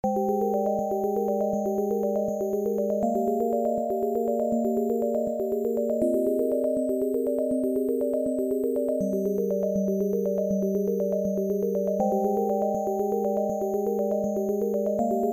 8bit

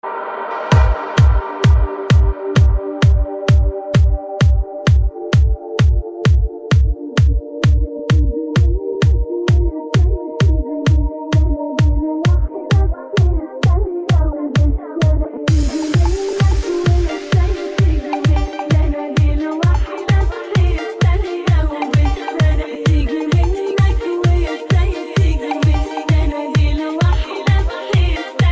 arabic trance

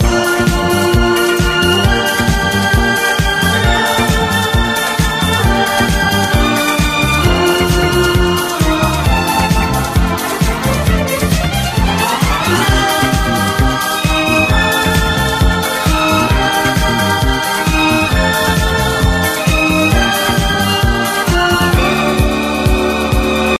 Early 80s

